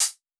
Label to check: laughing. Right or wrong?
Wrong